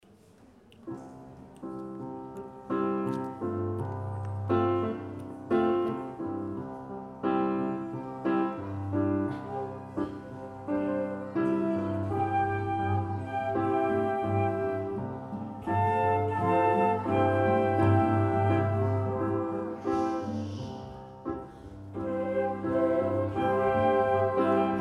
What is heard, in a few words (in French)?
Kumbaya flûte et piano; Coutras